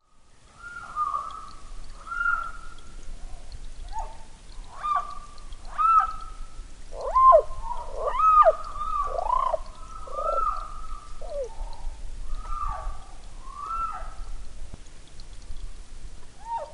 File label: okinawaisikawagaeru call; yanbaru